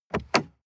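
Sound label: sports car interior glove compartment open short; verkehr-transport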